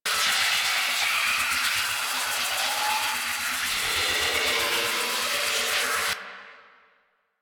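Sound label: Sundering Dissipation projectile Loop; Warframe; Focus; Unairu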